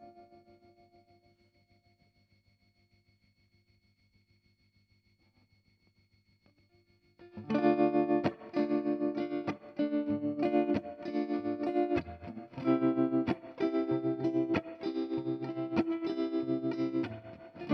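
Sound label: guitar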